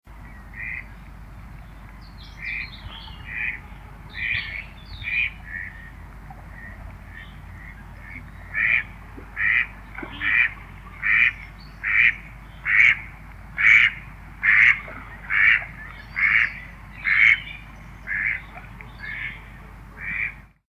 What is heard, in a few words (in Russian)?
selezen, shum prirody